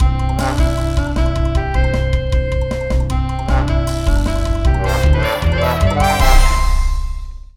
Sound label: achievement; cloning clyde; xbox 360